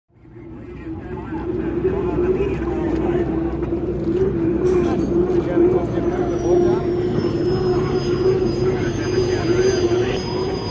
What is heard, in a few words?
Hydroplane Dock Amb